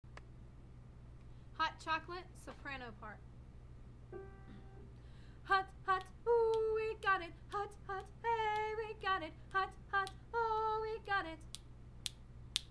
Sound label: soprano